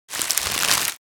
Rustling small paper bag

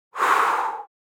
Human breathing exhaling blowing out candles

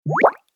Playful bubble pop

